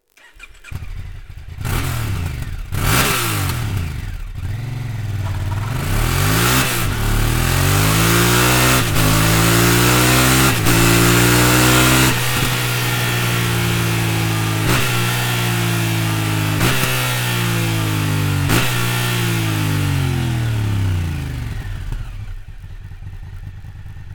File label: stock; akrapovic